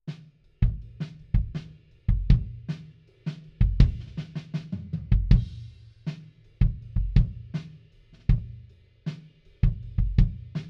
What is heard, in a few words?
Kick In, 160 BPM